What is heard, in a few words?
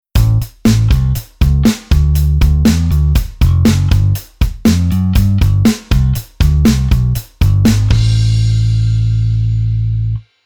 Bass Arr CS Appro Aprro